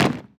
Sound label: foot; Lockstep